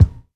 fluffy kick drum single hit g sharp key

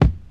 modern clear kick drum one shot d sharp key

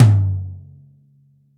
long room reverb tom drum one shot g key

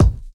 prominent kick one shot b key

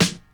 nineties original rap snare drum sound f key

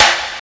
crisp acoustic snare sound g sharp key

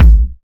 studio steel kick drum g sharp key

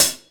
short foot cymbal C# key; hits one shots; hat samples; C sharp

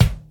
smooth kick drum g sharp key

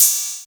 open high hat sample g key

